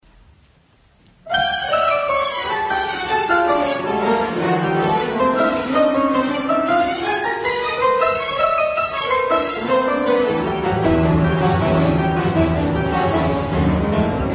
Piano Concerto in G minor, Finale Allegro tempestuoso; Prokofiev; Piano Concerto No. 2